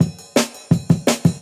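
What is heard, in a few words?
Jungle Breaks, 168 bpm; Original Jungle Breaks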